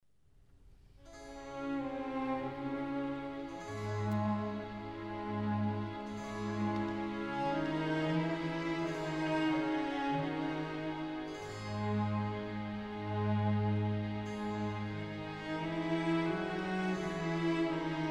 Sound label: Concerto E Major, Violins; BWV 1042